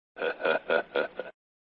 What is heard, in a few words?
Sound Swordsman Laughter, flipper